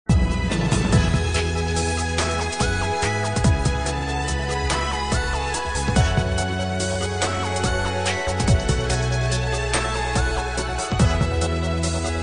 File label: Forever Romantic